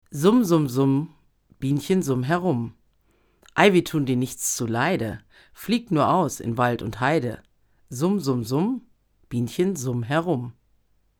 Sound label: Vox bienchen cardioid GA 47